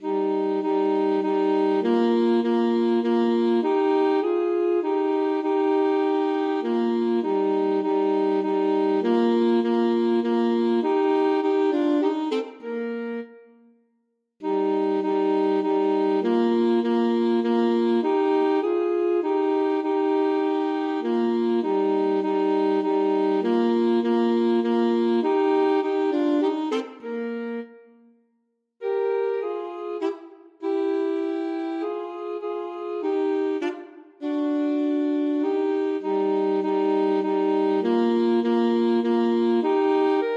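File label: duos menuetto, alto saxophone duet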